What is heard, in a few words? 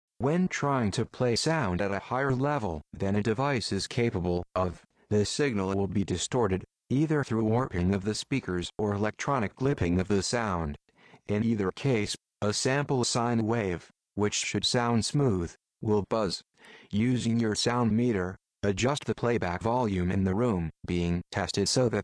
Distortion Test